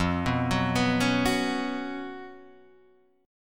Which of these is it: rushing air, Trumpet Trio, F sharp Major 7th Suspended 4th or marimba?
F sharp Major 7th Suspended 4th